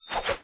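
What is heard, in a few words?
weapon infantry spear; Battle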